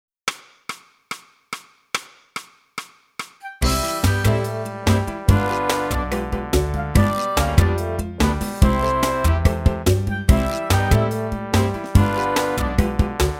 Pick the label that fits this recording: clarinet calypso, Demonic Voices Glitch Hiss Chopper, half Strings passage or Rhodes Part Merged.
clarinet calypso